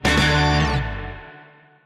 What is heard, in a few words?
Race Stinger; Disney Infinity